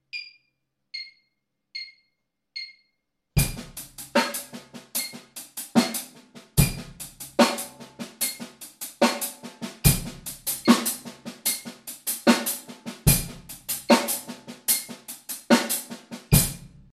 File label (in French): exemple paradiddle grosse caisse